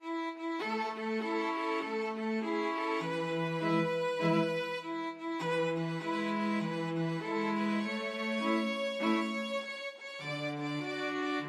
string quartet

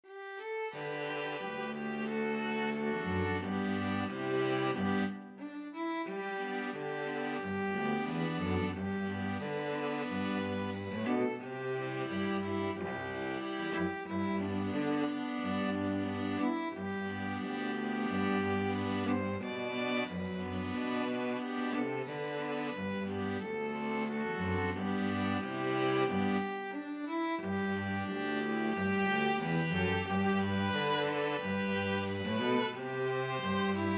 string trio

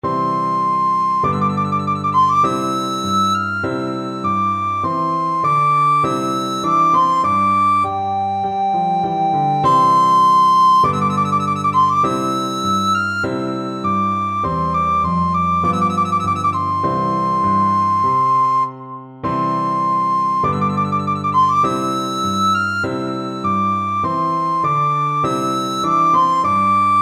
trumpet voluntary, recorder

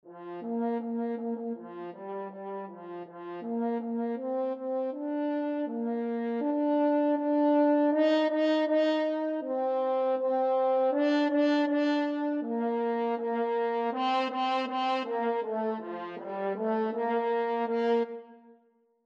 Bingo, french horn

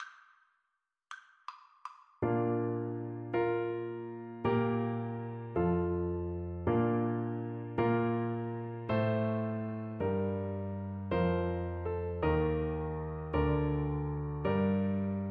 begotten FL kar, Flute

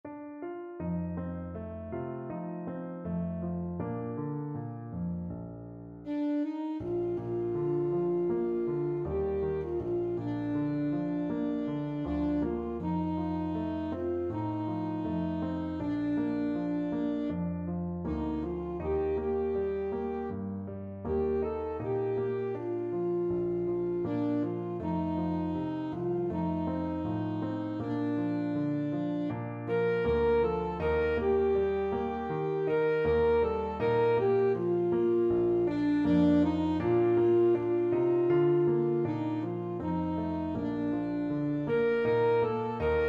ribar plete, saxophone

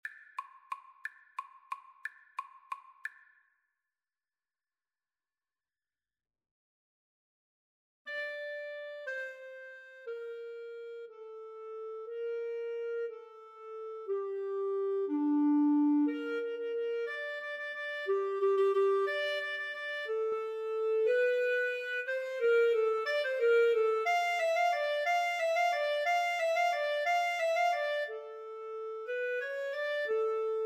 carol of the bells, Flute-Clarinet Duet

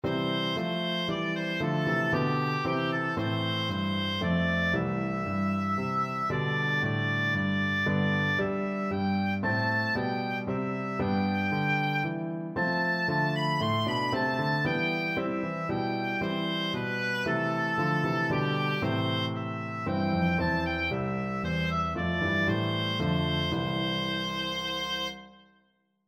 be thou my vision, oboe

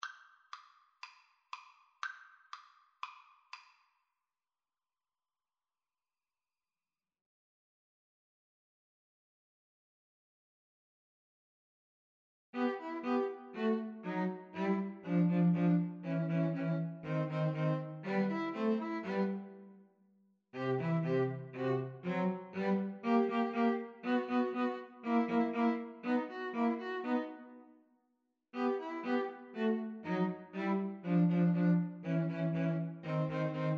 Piano Trio

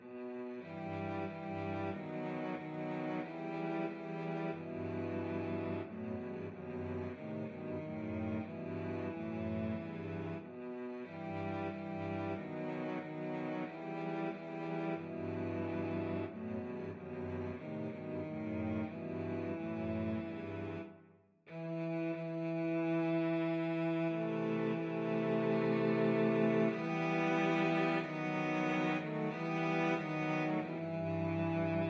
cello trio; go down moses

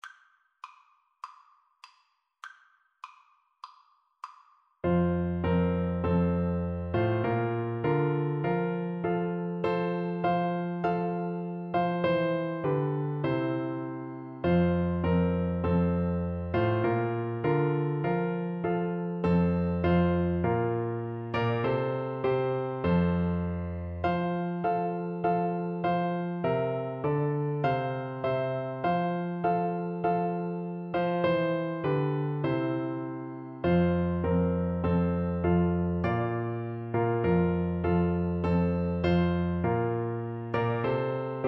tis so sweet, Flute

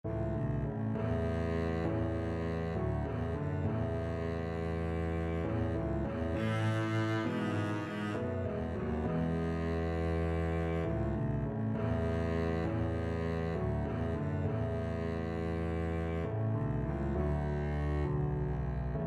blessed assurance, double bass

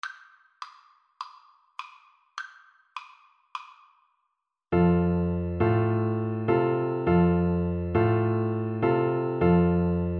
la cucaracha bassoon

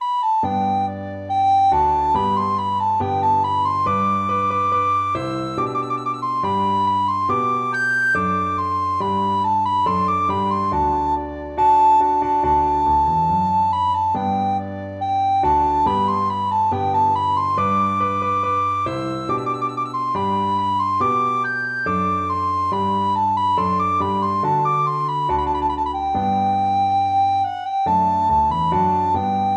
recorder

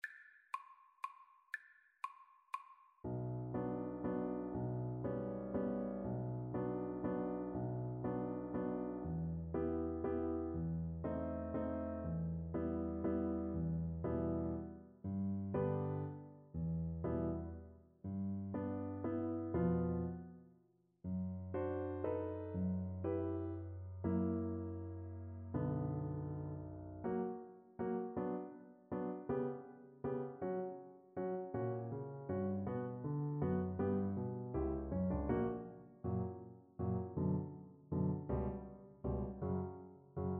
waltz, Viola

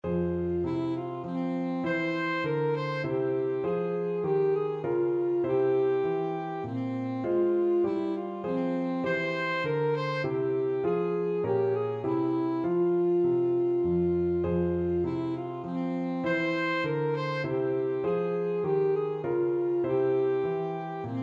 air, saxophone; purcell

